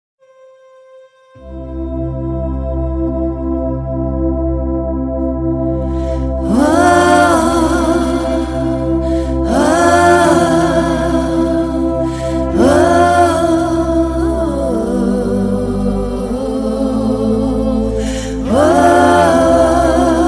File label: Lullaby